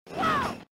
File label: Crash Whoa